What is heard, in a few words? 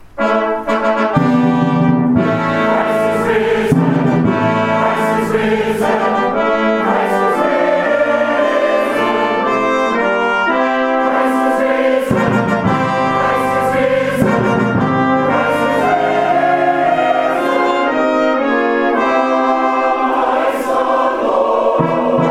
Easter Fanfare